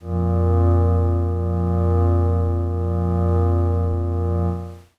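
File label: Glass Howl Kawaii A sharp 6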